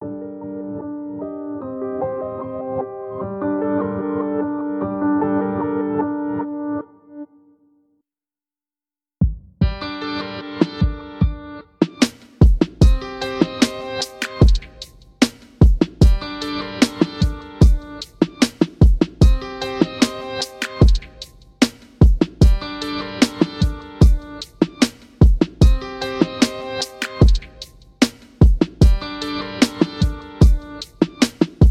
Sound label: Instrumental